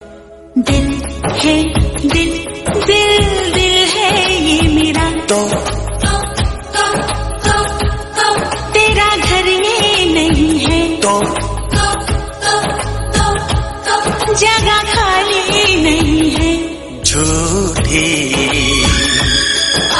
hindi sad

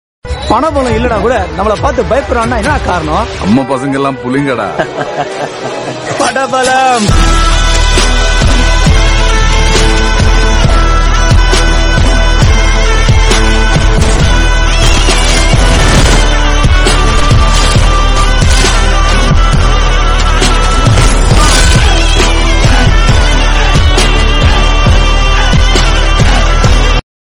bgm tamil

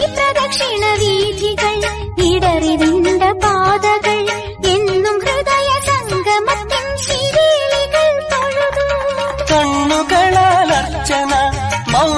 malayalam

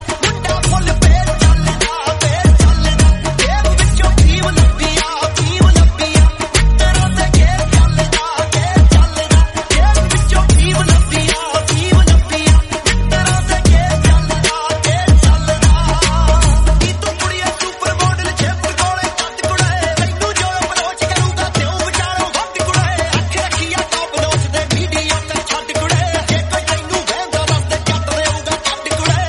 punjabi song

